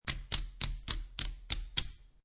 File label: Poker Chips Counting Chips; Gambling; Casinos